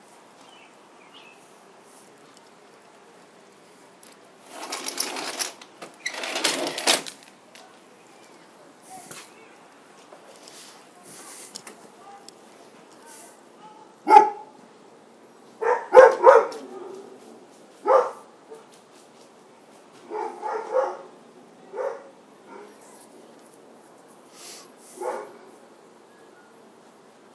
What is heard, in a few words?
people approach dogs alert